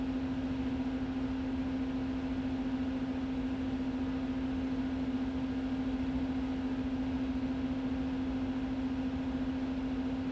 light computer; teardown ps5 windows xbox series xs gamerip 2022

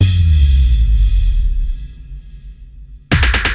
Music transition; Music Game General

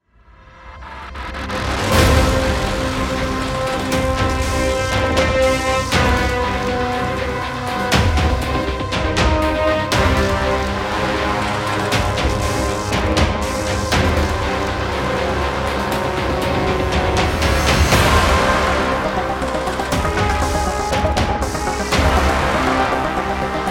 Battle BOSS; Resonance Solstice

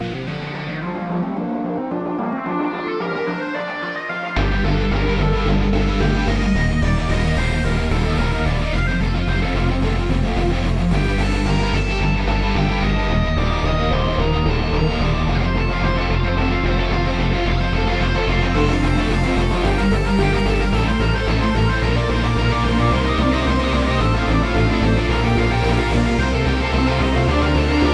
noperc; World of Goo 2

